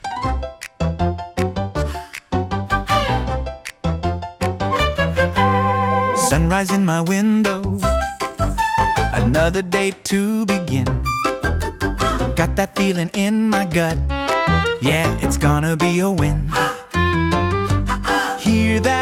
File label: Singing Feels Good